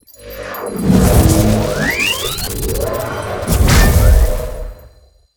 Elemental - Neutral Cast Single; SoundMorph

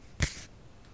card Shove, Casino; Kenney's Sound Pack

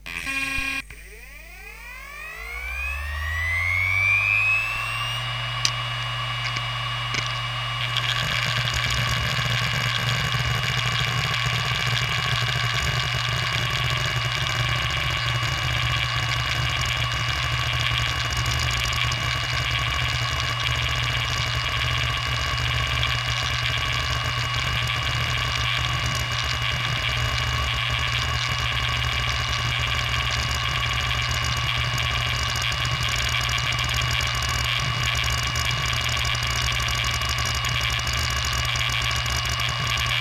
external HD mono, Computer Noises

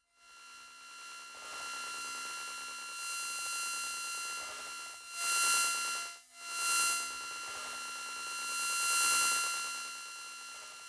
Unseen Noises; USO

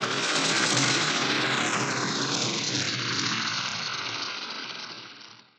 Movement; 99Sounds; InterSpace

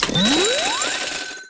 COINS Collect Jackpot Win; GameBurp; 2000 Game SFX

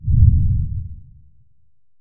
low Frequency explosion; Kenney's Sound Pack; Sci-Fi Sounds

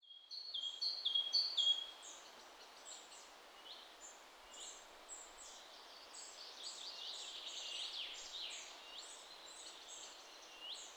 birds outside wide; 3maze; European Ambiances

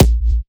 Kick, Data Mosh Hits; 99Sounds; Mangling Audio

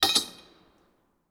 Metal Pipe; 99Sounds; The Warehouse

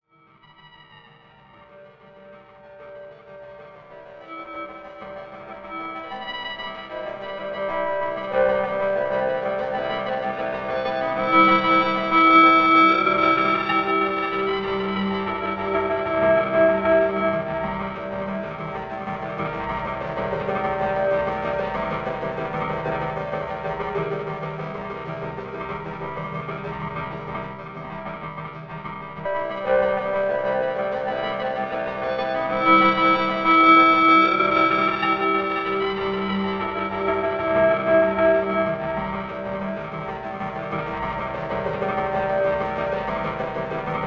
Atmospheric; 99Sounds; The Weird Side Samples

